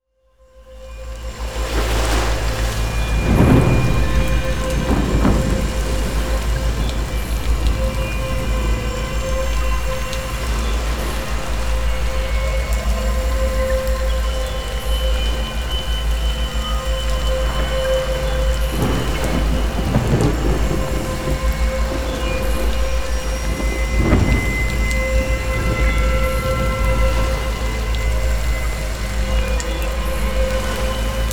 Âm thanh bầu không khí căng thẳng

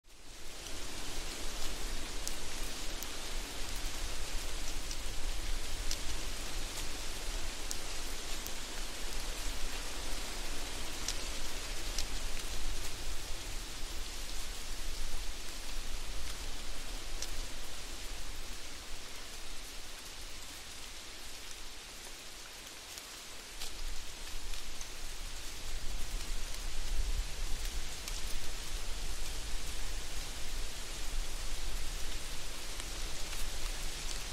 Tieng la cay xao xac

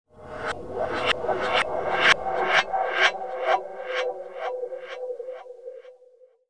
am thanh bien mat phim kinh di rung ron

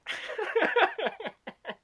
chang trai cuoi ngan

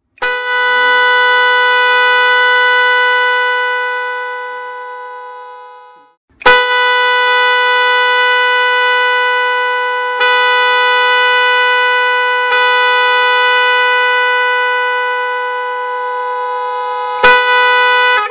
tiếng còi nhại

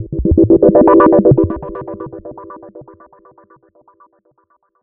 Am thanh moi thu dan dan xuat hien cang thang

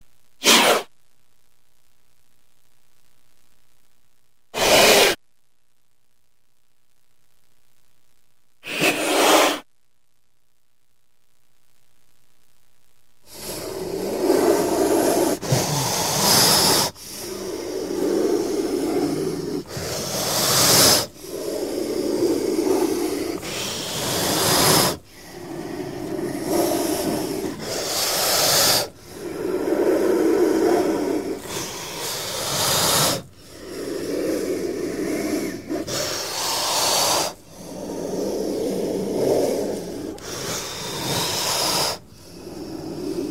Tieng ran keu

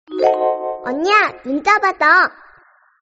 Nhạc chuông tin nhắn dễ thương Hàn Quốc

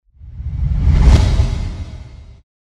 hieu ung am thanh slow woosh

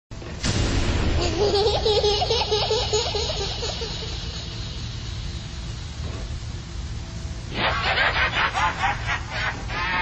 Tieng cuoi tre con kinh di dang so

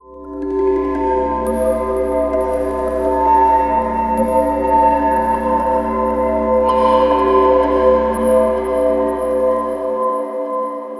Am thanh kim loai vang vong

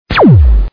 Tieng sung laser ban trong phim khoa hoc vien tuong

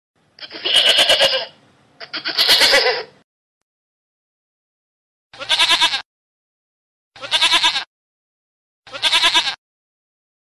tiếng con dê kêu be be

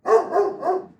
con cho sua ngan 3 tieng

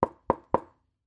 Tiếng gõ cửa nhẹ nhàng 3 tiếng

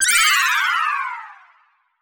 Am thanh that bai trong tro choi

